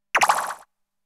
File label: Thunder; Namco Game Sound Express Vol.1; Valkyrie no Densetsu